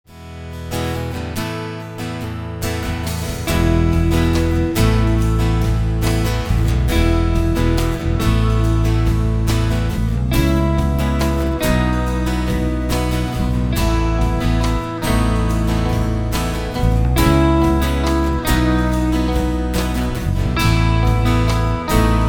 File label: CLEAN